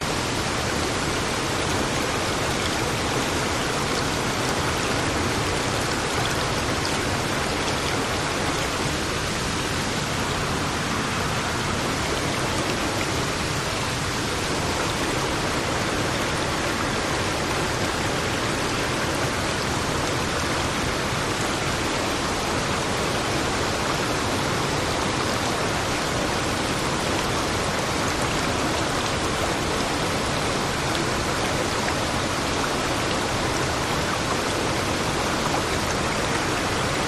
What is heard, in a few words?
creek waterfall in distance; bridal falls; your free sounds